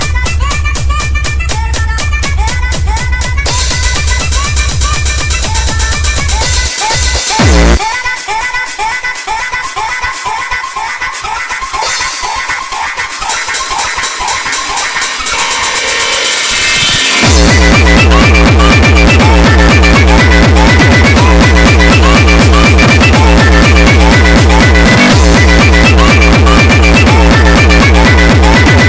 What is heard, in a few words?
speedcore